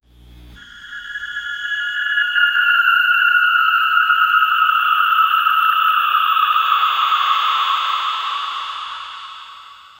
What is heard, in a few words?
magical scary scene of a, fog